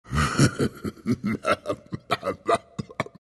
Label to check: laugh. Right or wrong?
Right